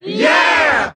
"yeah" crowd; ooh ahh

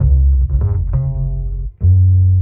bass, Loops

